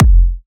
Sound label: Punchy Kick